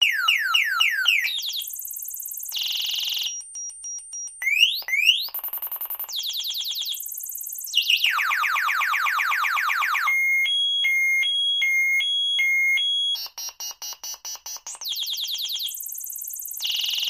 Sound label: Alarm Car Type 1 To 6, car alarms